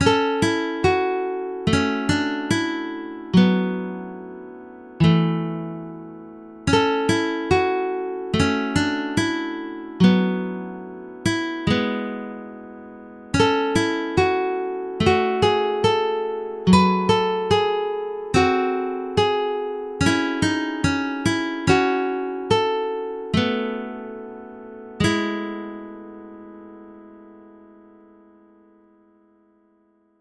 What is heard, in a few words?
music box; final fantasy crystal chronicles